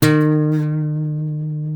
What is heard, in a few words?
E 3 MARTIN 6, Guitars; Spectrasonics; Hans Zimmer Guitars VOL-1